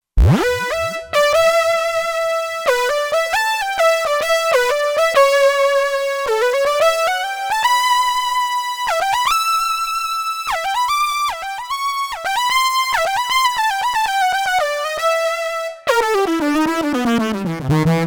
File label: Modular Lead, Kurzweil K2500X; Factory Programs